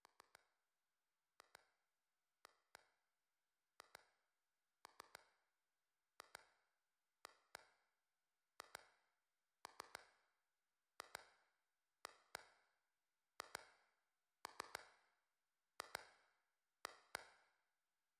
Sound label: Drums